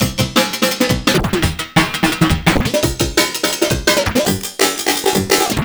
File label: 4 Bar Pitch-Filter 170bpm, Drum and Bass Breaks, FX Drums; EZ Rollers